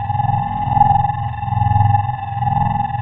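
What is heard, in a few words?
force field; Star Wars Knights Of The Old Republic I; XBOX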